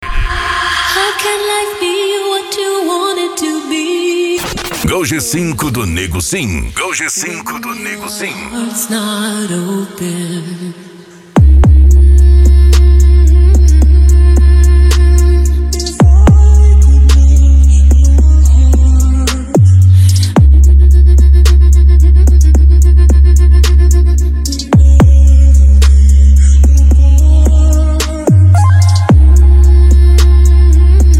funk mega bass e noia dance

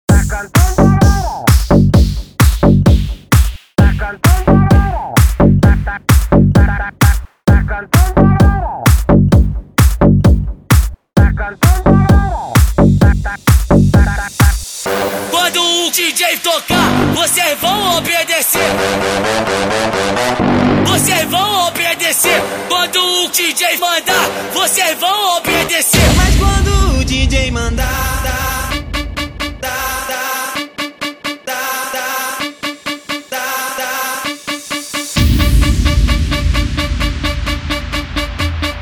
mega funk

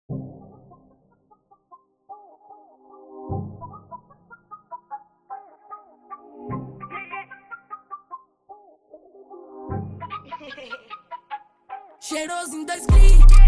graves bass

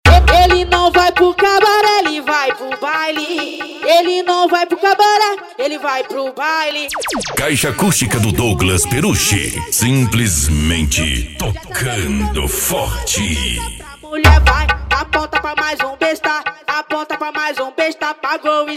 especial funk mega bass

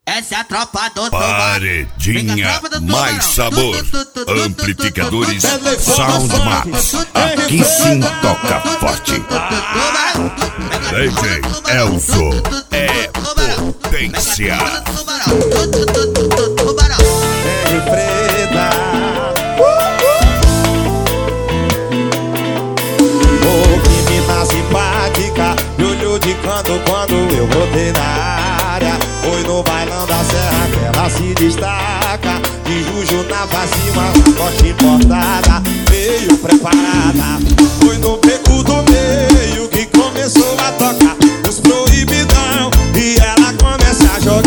medley forro funk

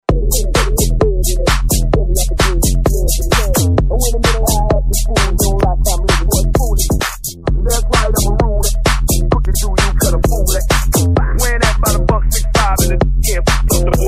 baile funk som automotivo; araguapaz go

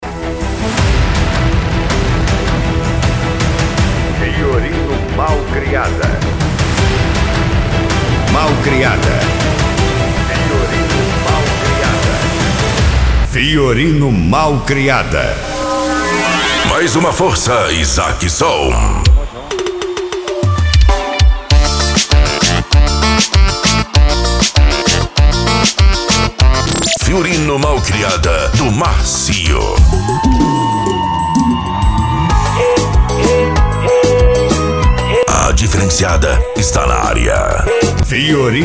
piseiro forro

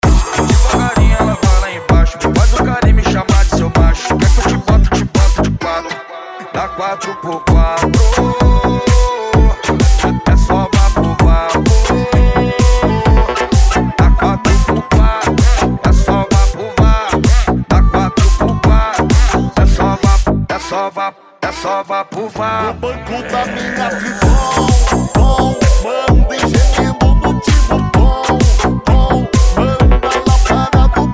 sertanejo remix

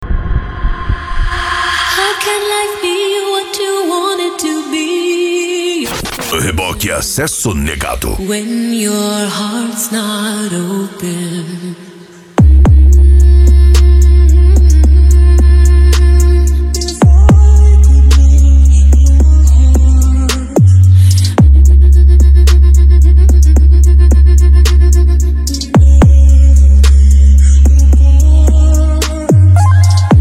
racha eletronica e funk mega bass

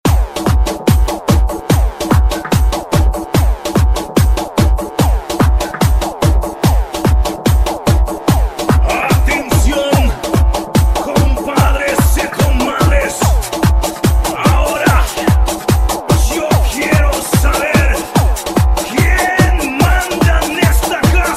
esp de pancada

